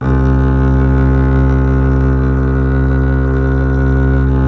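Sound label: string contrabass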